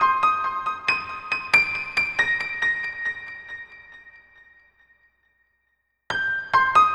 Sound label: Piano, trance; trance loops